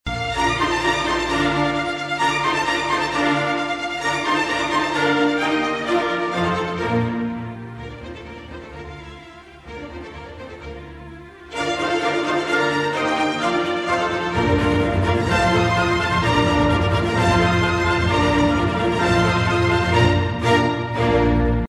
Cadence Section; Mozart Sym40 4th Mov

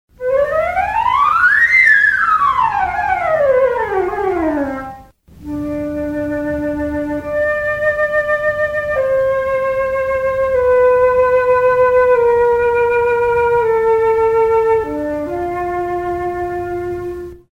flute

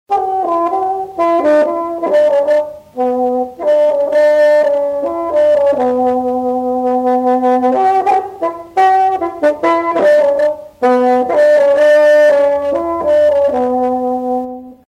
bassoon excerpt

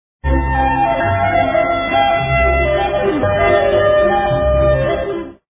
clarinet excerpt

